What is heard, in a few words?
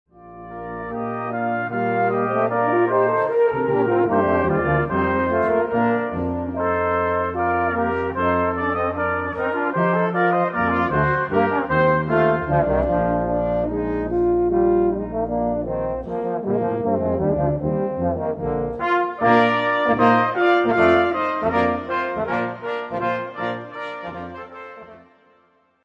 Brass Quintet Ode To Joy; Ludwig van Beethoven